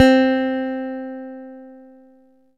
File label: Warm Nylon C3